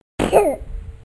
小孩喷嚏

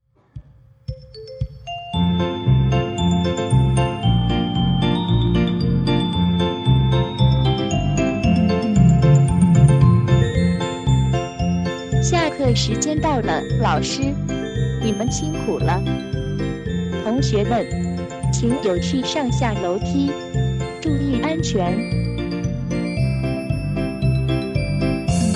下课铃声; 钟声; 广播室铃声系统

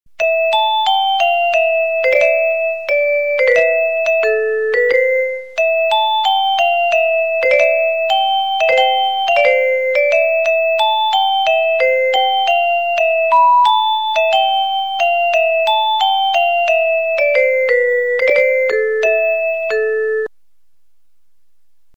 音乐铃声; 钟声; 广播室铃声系统

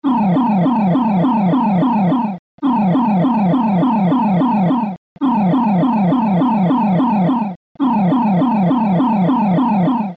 盗警; 告警; 广播室铃声系统

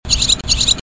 燕子

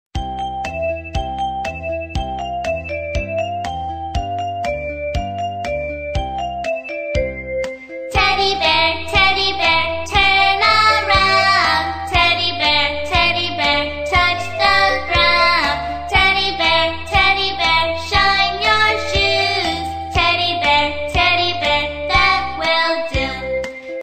teddy bear, 英文儿歌

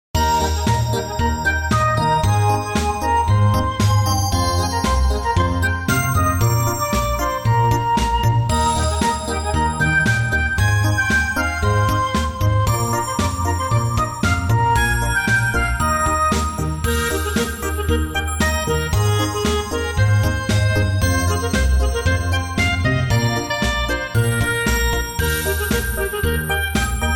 纯音乐 - 欢快热情的音乐

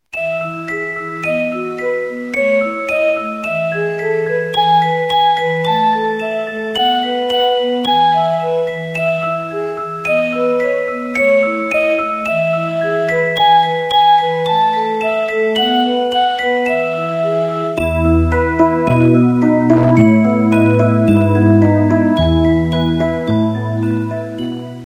音乐铃声

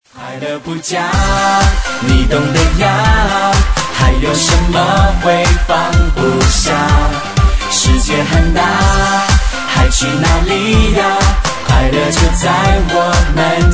铃声

